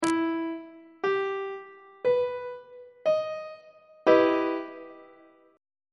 d double sharp minor major 7th chord; on treble clef

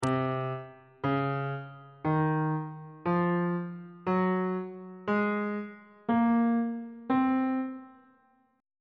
c locrian mode

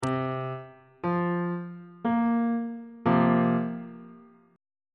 a sharp suspended 2nd 1st inversion

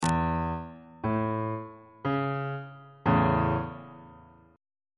a major 2nd inversion on bass clef

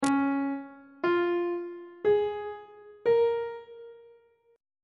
c sharp major 6th chord intervals on treble clef

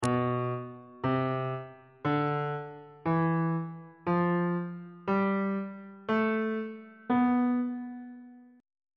c flat locrian mode

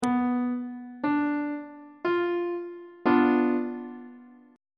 c flat diminished chord; on treble clef

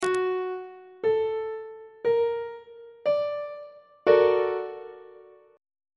a sharp augmented major 7th 2nd inversion; on treble clef

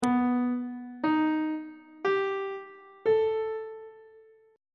c flat augmented 7th chord intervals; on treble clef

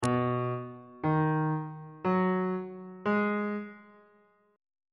b major 6th chord intervals on bass clef

